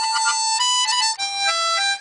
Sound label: Harmonica